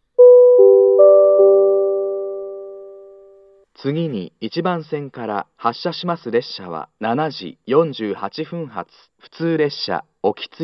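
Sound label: Shimizu next departure; Okitsu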